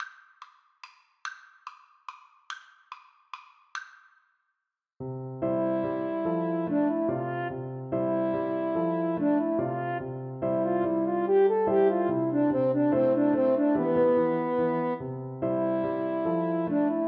2 horn

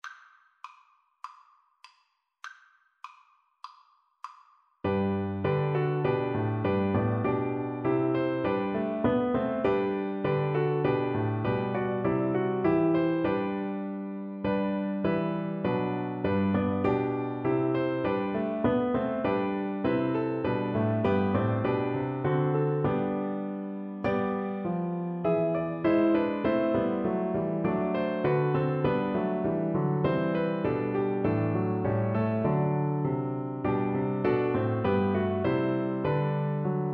angels Recorder

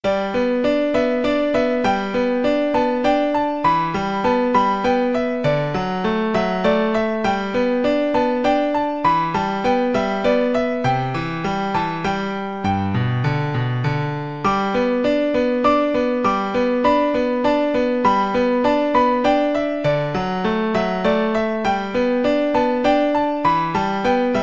arirang, piano

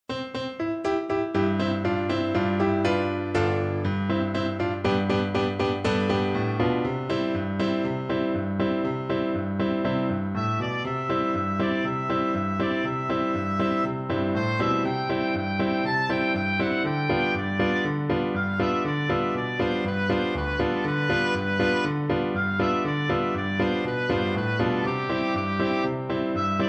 la adelita, oboe

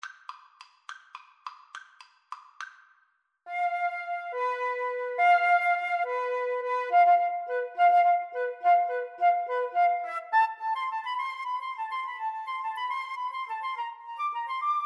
flute duet

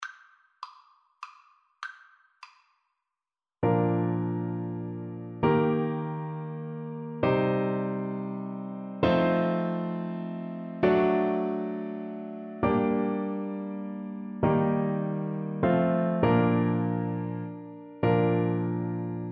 Soprano Saxophone

